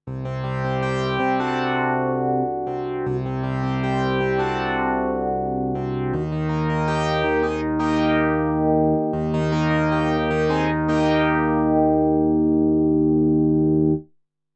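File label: K150 synth sweep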